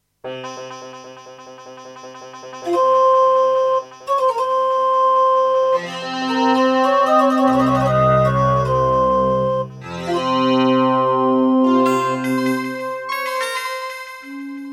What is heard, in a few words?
Intro, Gallant GMW-1000; Prince of Persia 2 - Intro